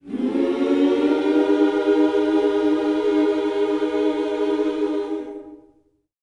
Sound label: MINOR, MIN.SCOOPS, Vocal Planet, Gospel